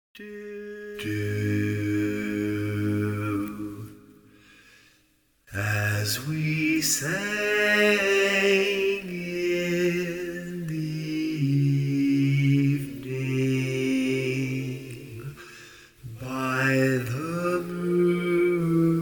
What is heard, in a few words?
Bass, barbershop